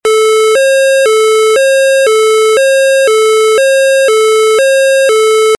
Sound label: tone